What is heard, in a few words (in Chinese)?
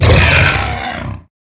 死亡声; 疣猪兽